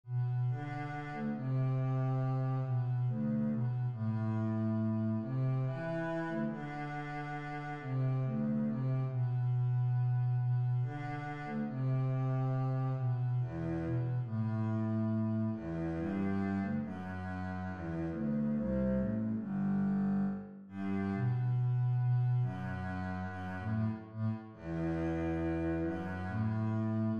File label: waltz bass, string bass